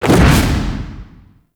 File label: Reaper Jump; Warframe; Wraith